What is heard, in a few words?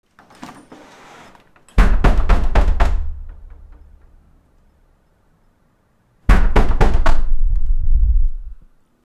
the sound of someone thro, door